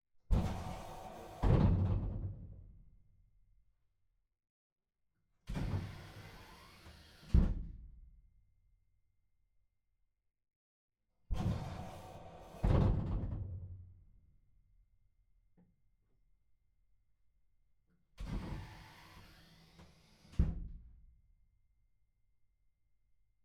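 B-25 interior foley bomb door open close flaps rear door front door window horn breaks, North American B-25 Mitchell; switches cockpi; Pole Position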